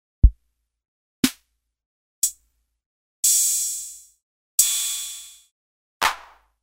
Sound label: Boss DR-110 Soundz